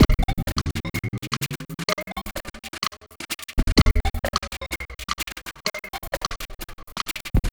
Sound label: Terrestrial Hybrid, Processed Percussion